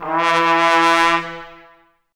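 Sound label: BRS trumpets E; 90 s Sample CDs; Miroslav Vitous; Woodwind Brass Ensemble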